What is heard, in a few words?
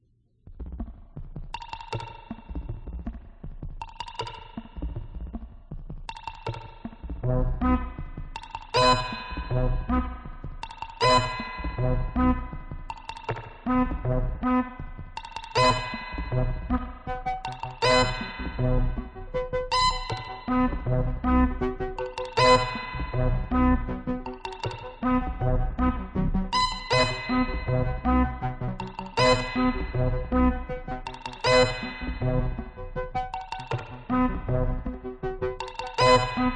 Env Follower